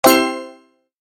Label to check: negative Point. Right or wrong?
Right